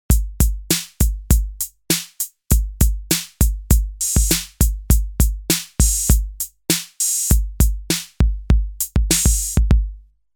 Revolution 808

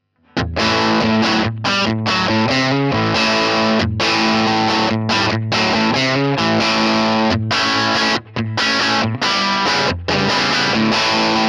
strat gain; Class 5

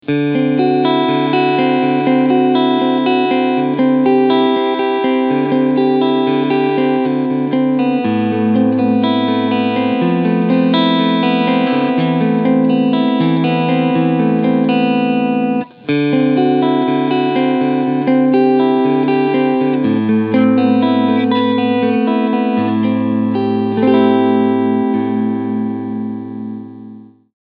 Cleansound Front PU

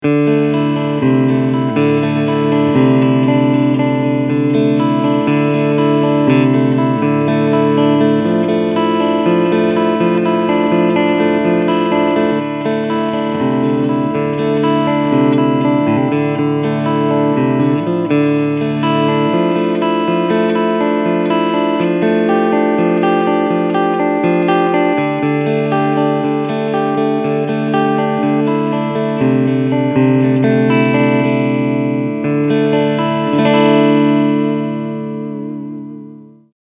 Clean Front HB

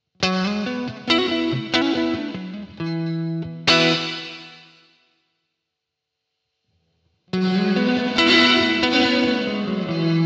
VALETON Dapper Indie reverb room riff

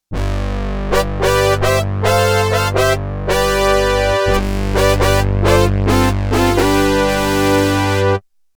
Marion Systems MSR2 Cliche Brass

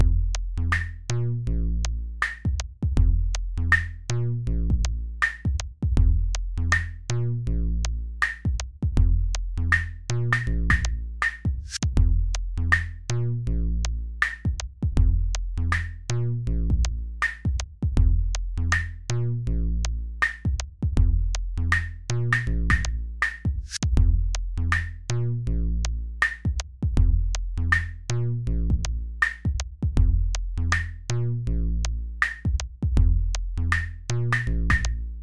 Microtonic Bassline

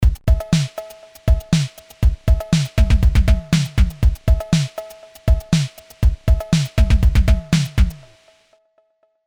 CMI V Beat Dist COLDFIRE Master Weight Presence

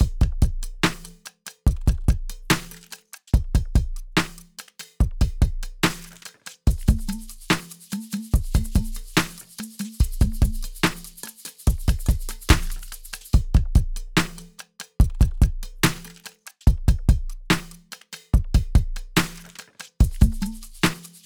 unprocessed acoustic drumloop

